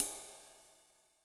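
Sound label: Sizzle Dark, Cymbal; Yamaha DTXpress-II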